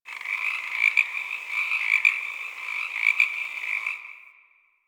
Beach Night Frog; Ambience; Warframe; Grineer Beach

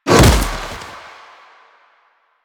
Finisher Throw Impact; Finishers; Enemies; Warframe; Kuva Lich

